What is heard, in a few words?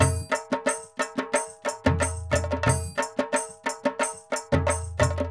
Gabra Dholki Loops